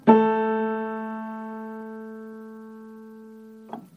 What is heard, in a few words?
Baldwin A3 A4 pure very narrow octave no checks